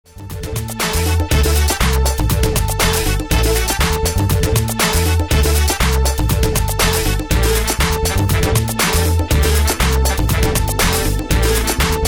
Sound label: ooh yeah